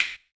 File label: CLP, CLAP; ILIO - Ethno Techno